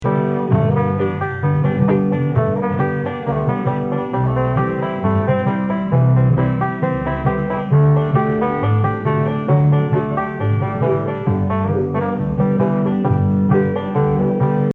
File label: slow